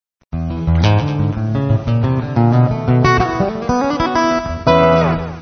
acoustic blues, guitars

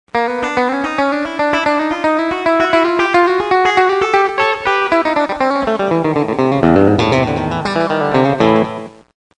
climb neck, guitars

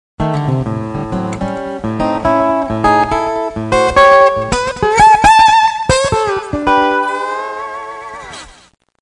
acoustic blues

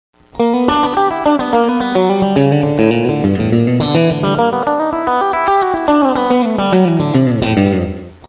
open strings, guitars

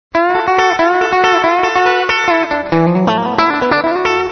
country chop

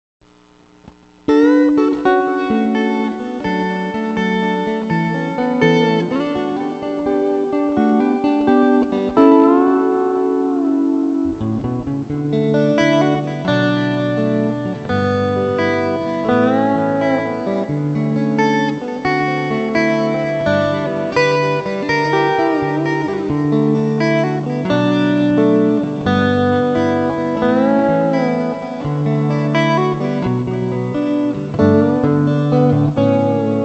delay, guitar

